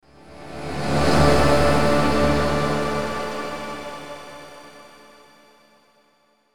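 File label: Musical Element, Drum Snare March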